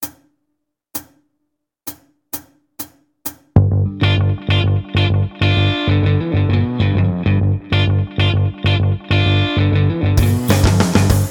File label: Riff In G